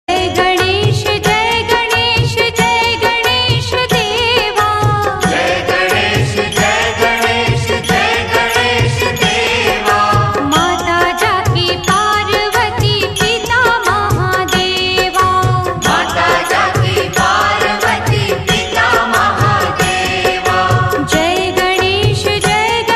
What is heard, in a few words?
Mobile tone